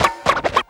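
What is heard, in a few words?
scratch, record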